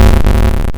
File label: explode; OpHog